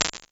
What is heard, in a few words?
Plate, Impulse Responses, Plate Reverbs; Small Spaces; Apple